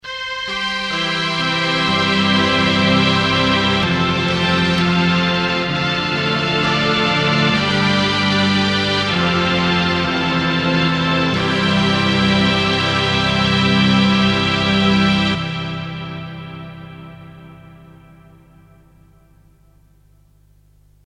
orch

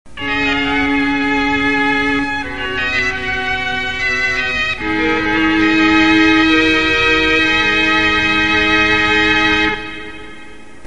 violin

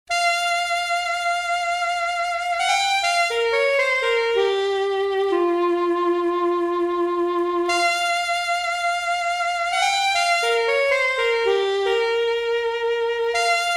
saxophone

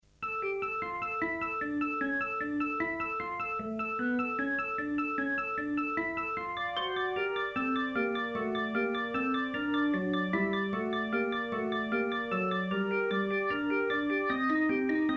hammond c3 clean

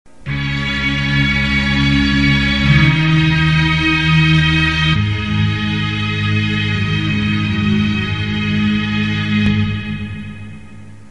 str sect

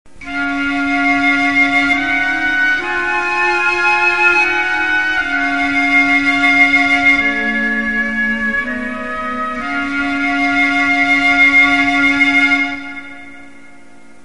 flute

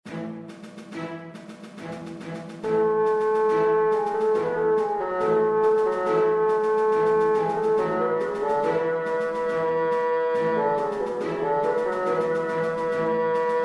bassoon

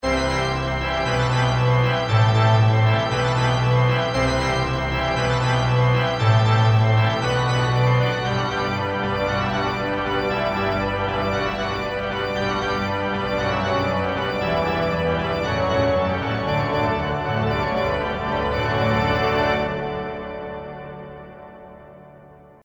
church or

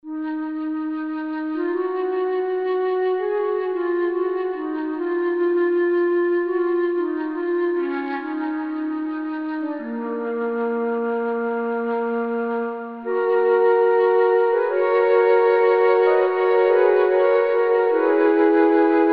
mk2 flute

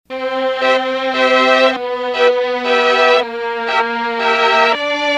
classic strings

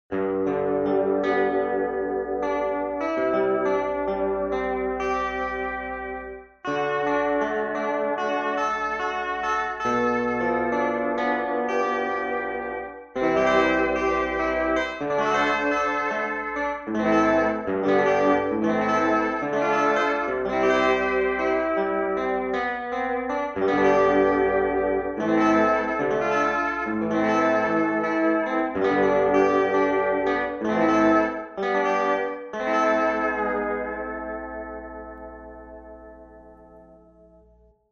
hawaiin guitar

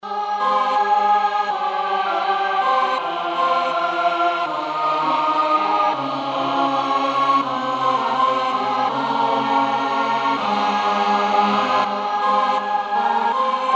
combined choir

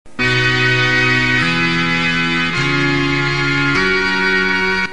accord; italian accordian

